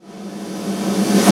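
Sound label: reverse snare, right; East West - Phat & Phunky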